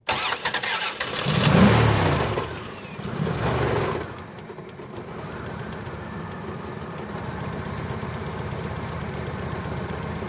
Car; Starts, Idles With Very Heavy Rattles, Off, Front Perspective